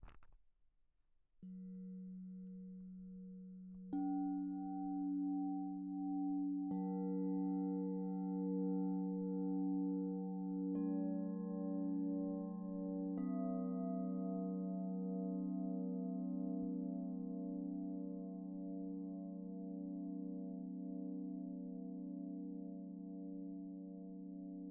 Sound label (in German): Regenbogenkristallklangschalen